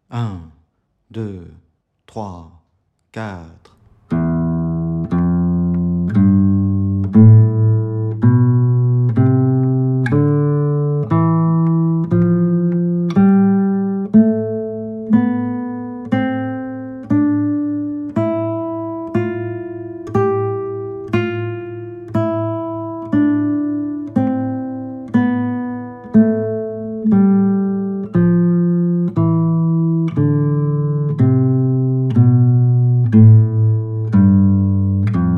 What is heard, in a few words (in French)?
exercice 060bpm, guitare